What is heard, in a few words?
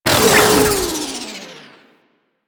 Mutalist Death, Vocals; Warframe; Proto Infested